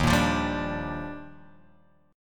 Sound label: D sharp Major 9th, Guitar Drop D